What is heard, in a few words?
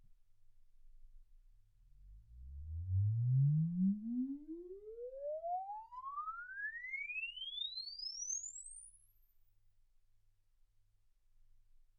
exp swept sinus 10Hz 24kHz